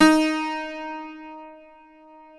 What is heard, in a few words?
PLK D#3 Bouzouki, Greek; NorthStar - Global Instruments VOL-1